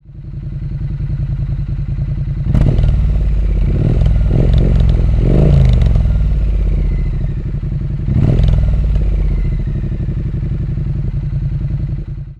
Triumph Street Twin motorcycle idle big rev behind perspective, 900 CC; Matt Script